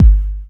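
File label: bass drum hip hop, drum kit; DRMKIT; E-Lab; X Static Goldmine 2; akai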